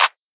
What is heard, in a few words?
Clap, Hip Hop and Trap; Ghosthack